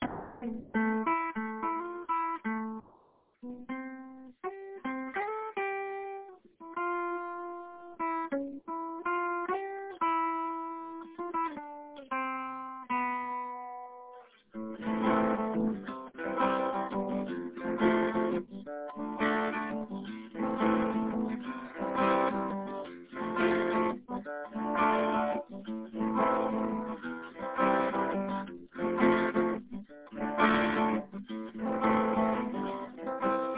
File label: salty old man or something